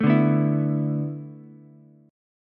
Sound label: B Minor 11th B down Ukulele C6; Bm11 strummed; JGuitar